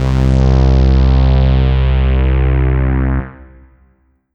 Kawai K5000W Taurus C2

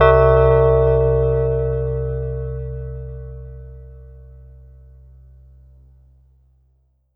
Yamaha TG500 TP Clock C2

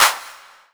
Roland SC-88 Hand Clap

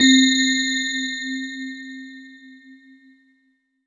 Korg 01W VS Bells C4

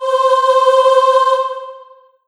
Roland SC-88 Choir Aah C5

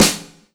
Korg TR Rack Standard Kit Snare Drum

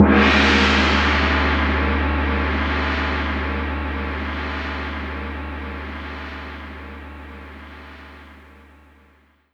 Roland R-8 Gong

